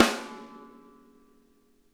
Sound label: SNARE; Sonic Reality; Interactive Drum Kits